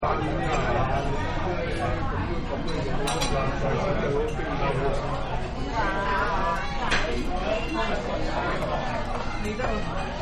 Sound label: Chinese Restaurant Ambience Loop-Dishes Clinking, People Orde; Loud; People Ordering Food And Having Conversation In Cantonese